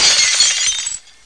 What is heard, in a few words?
glas brk; duke nukem total meltdown